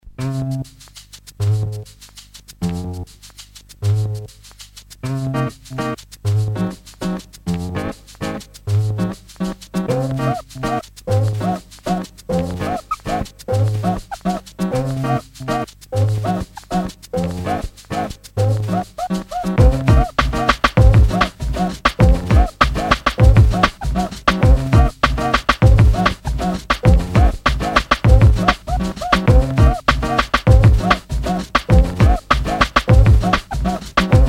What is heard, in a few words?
Samba Skratch